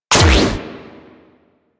Fire; Warframe; Acrid

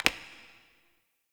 Ur Piece Place; UI; Minigames

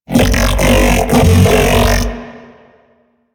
Mech Ambient Vocal; Nechro Mech

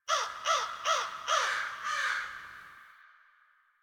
Bird Crows; Ambience; Warframe; Nineteen Ninety Nine

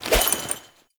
Fishing Spear Bait Throw; Warframe; Weapons; Eidolon Fishing Spears

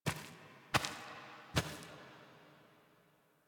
Foley Steps Turn End; Cinematics; Operator; Warframe; The War Within; Mountain Pass

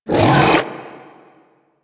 Rock Punch Cast; Warframe; Brawler

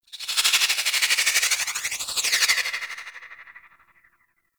Freeze Ray Laser One Off; Warframe; Weapons; Corpus

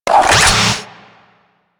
Shock Rifle Reload

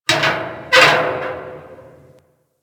Derelict Metal Clunk Squeal; Ambience; Props; Warframe; Orokin Derelict

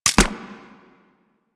Sidearm Mech; Weapons; Warframe; Tenno; Wraith Sidearm

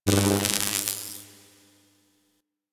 Shield Recharge; DE; Warframe; Tenno Shield

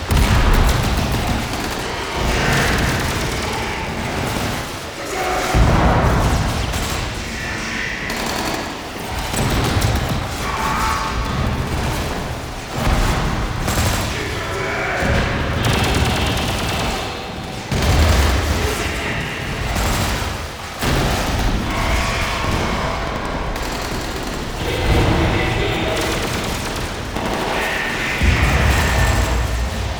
Battle Loop; Warframe; Nokko Colony; Visions

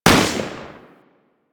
Deth Cube Fire 3D, weapons; Warframe; Sentinel; Deth Machine Rifle

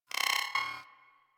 Beep Comby; Warframe; Ambience; Corpus; Beeps

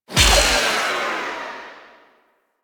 Discharge End; Enemies; Warframe; Infested; ZealoidPrelate

